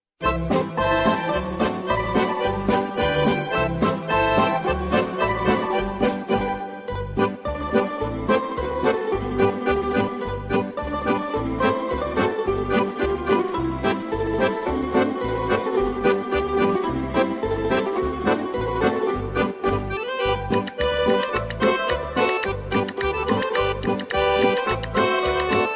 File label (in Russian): Шумовой Оркестр